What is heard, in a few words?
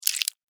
Insect, Claw, Small, Snap, Movement, Bug; Sound Effects:Animals:Insects; Blastwave